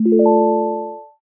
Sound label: finish level set; watertowerclassic